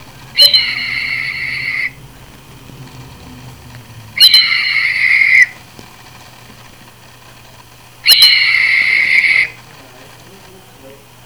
Red-Tailed Hawk

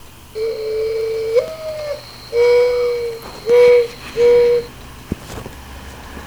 Mourning Dove

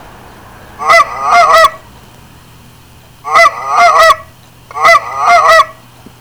Canada Goose

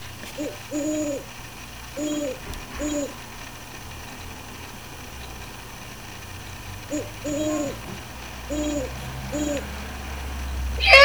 Great Horned Owl

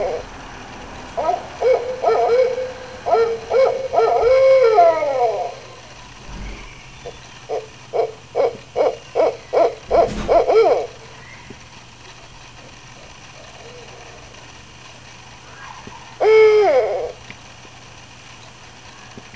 Barred Owl

